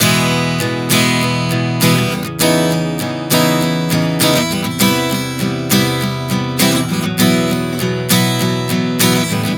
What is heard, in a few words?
E-C#m9-Cmaj7-B11 100 bpm, acoustic guitar chord progressions